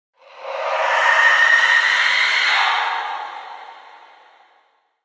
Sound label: banshee scream; mass effect 3